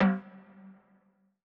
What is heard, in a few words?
perc percussion; RockScience